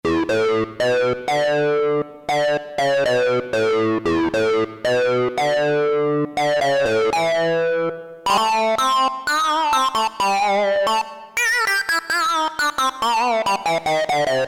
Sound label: SYNC, Roland Jupiter-8; Factory patches